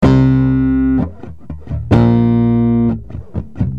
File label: Bass Chords C,Bb 128, Electric